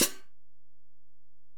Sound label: HiHat, Alesis DM-PRO